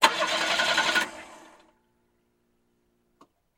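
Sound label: car starter sounds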